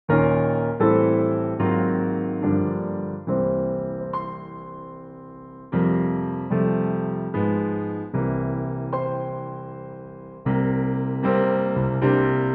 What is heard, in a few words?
F piano